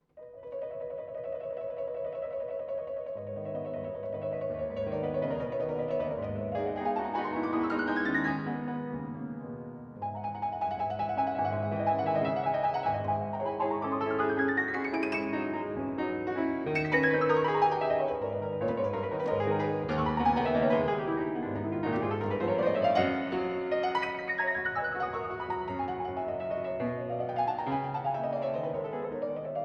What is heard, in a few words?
g sharp minor